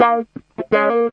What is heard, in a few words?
disco guitar notes